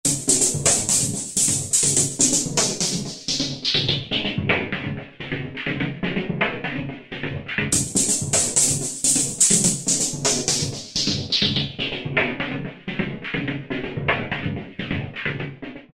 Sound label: 125, House Collection; Brzl2000; Roland SR-JV80-19 House Collection